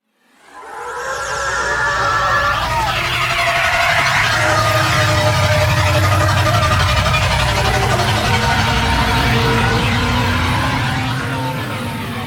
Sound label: Mountain Pass Drop Off; Vehicles; Flight; Warframe; WM Liset